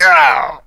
heavy pain sharp; tf; duke

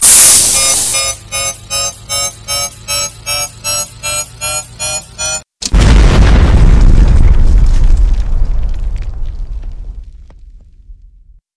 self destruction; Predator Mod

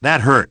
pain; ash

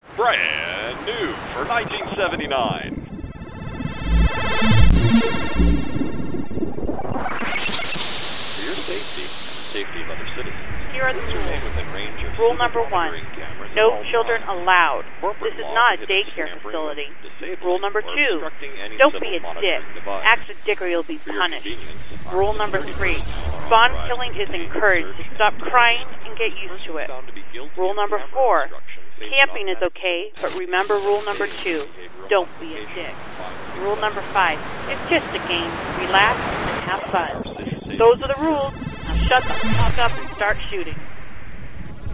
game start

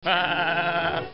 lolz

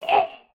hurt

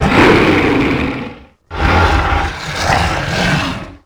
stun; tera creatures; alpine predator

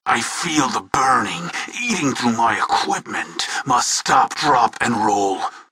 pain; tf

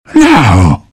death; tf; pudge

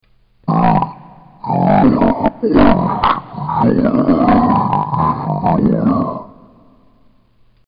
zombie; dod

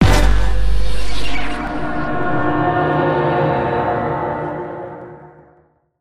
pong

